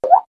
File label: Water Drop Sound High